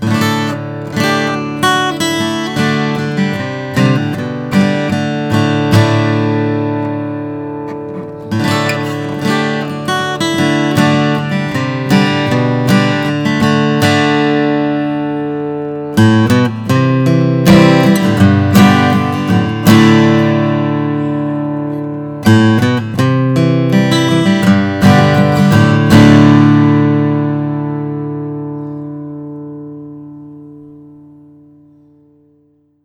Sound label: froggy bottom k deluxe koa natural